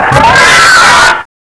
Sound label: scream